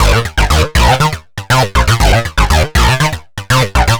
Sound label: Synth